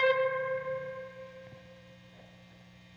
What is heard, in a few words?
guitar overdub; guitar overdub gareth